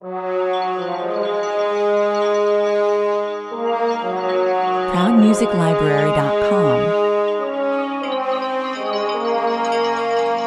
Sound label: commercial